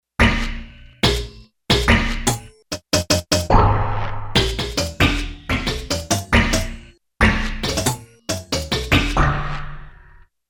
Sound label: Gated FX